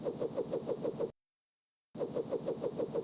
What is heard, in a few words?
heli play